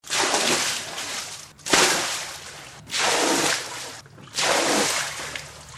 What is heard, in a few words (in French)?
eclaboussures